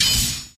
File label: count up multiplier attention; steamtower